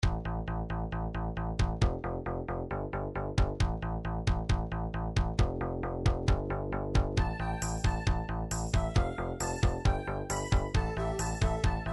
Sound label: goth techno remix; nintendo 64 donkey kong 64 dk isle